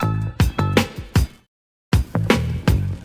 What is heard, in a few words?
piano, Loops; Phonky